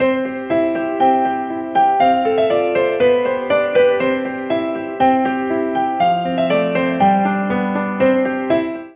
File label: ringer; snom3xx; Ringtones